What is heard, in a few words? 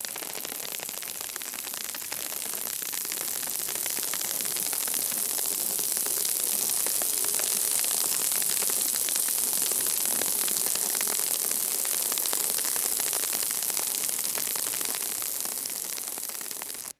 continuous dirt and debris falling